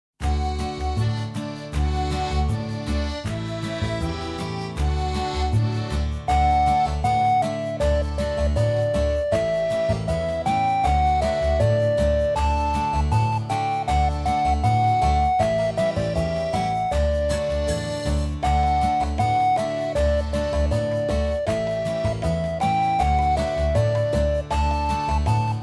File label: Melody